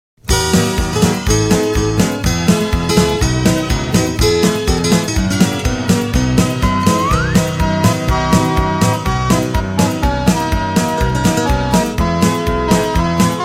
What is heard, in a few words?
colorado mountain sky (instrumental)